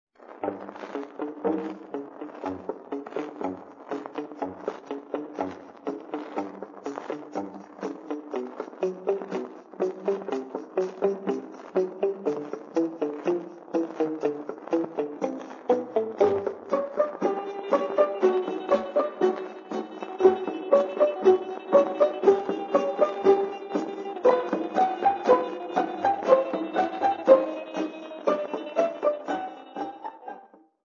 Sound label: Tango